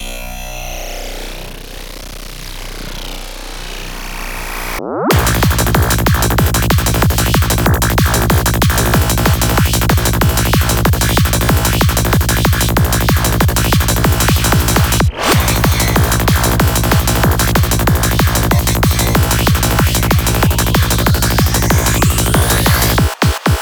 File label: Sample Pack for HIGH TECH; Sick Noise